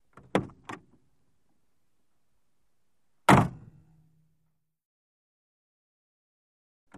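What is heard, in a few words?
Buick, Exterior Pov, Door Open and Close, close up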